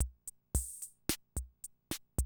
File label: CR78, DrumLoops